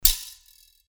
Sword Sound; FXHome; Fighting Sounds

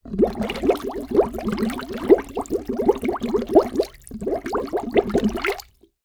LIQUID BUBBLE Sequence, Medium Force; Articulated Sounds; Bubbles Water & Aquariums

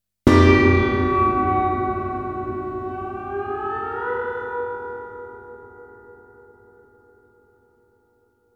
Orchestral Phrase; FXHome

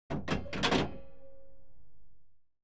BT Payphones, Dial, Receiver R; Computers Printers Phones; BBC Sound Effects Library

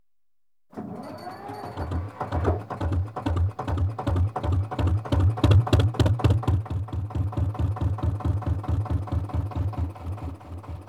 Canal Narrow Boat, Engine Started; Ships And Boats; BBC Sound Effects Library; Ships And Boats 2

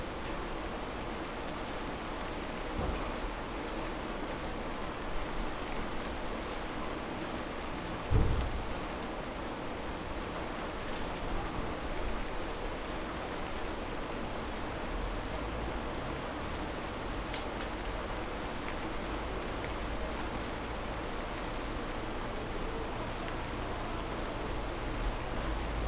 rain street; 99Sounds; Rain And Thunder

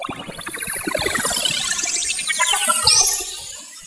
Mother Swirl; Navi Retlav Studio; Impulse Fractalism

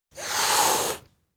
Tom Cat Hiss; Cats; BBC Sound Effects Library

